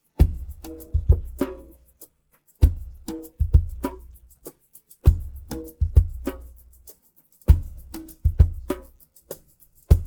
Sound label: pattern, drums